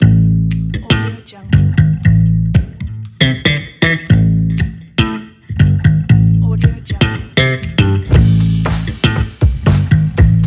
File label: funk bass logo